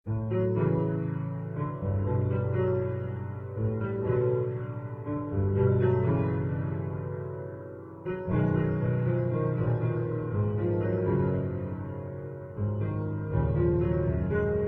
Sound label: Ambient Music; Lethal Company